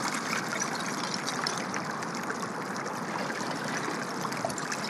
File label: Icy Stream, On The Glacier